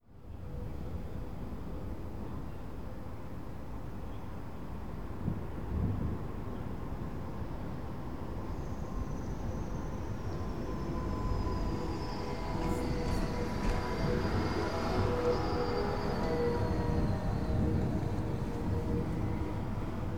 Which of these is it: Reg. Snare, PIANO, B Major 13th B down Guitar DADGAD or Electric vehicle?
Electric vehicle